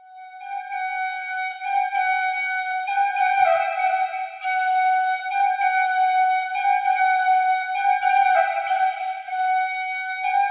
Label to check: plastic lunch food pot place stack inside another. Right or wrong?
Wrong